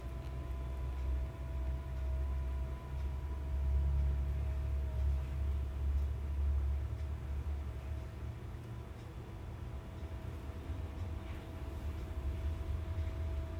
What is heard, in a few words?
Litter Robot 3 rotation sound